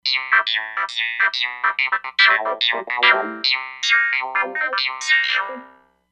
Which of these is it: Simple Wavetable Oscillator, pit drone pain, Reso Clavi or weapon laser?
Reso Clavi